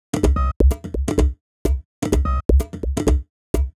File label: Top C# 127bpm, House Drumloops; Zero-G House Fabrik Drumloops; ZG Drumloops